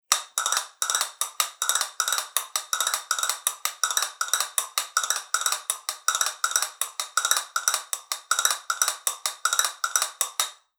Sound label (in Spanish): tela, castañuelas